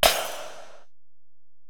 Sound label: Ac Perc, Drum Machines Alesis DM-PRO